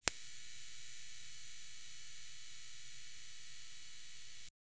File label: the electric buzz of; failing